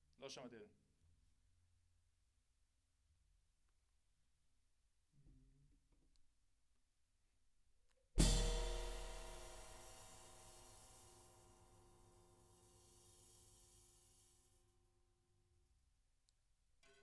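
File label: Snare Top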